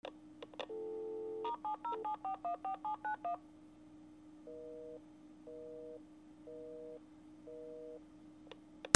PHONES

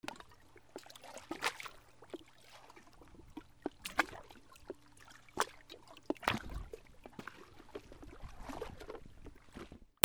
WATER

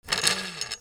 GARAGE

